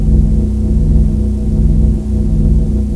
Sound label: electricite loop